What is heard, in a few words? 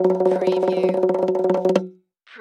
Musical